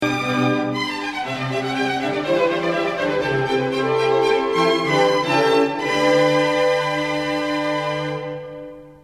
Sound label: cadence flute